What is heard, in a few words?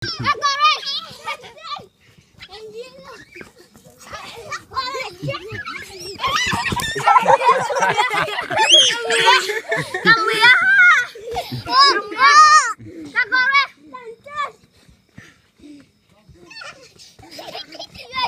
Kids playing Zambia